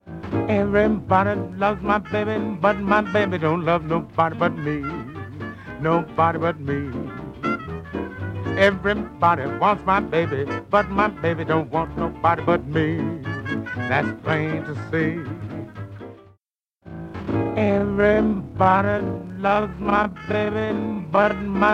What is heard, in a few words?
staccato